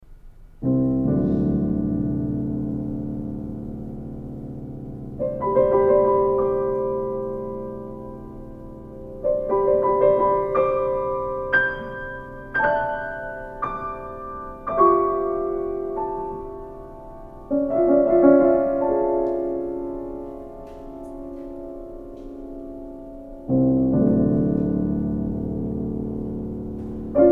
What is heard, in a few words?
Five Pieces for Piano Slow